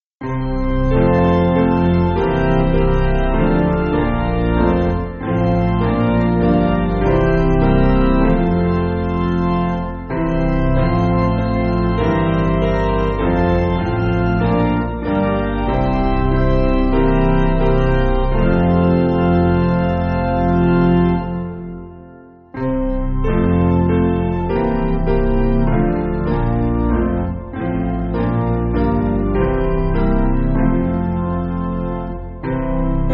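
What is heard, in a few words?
Piano, Music Backing; Christmas Candles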